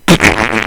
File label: rlaunch; HALFE LIFE 3; awesund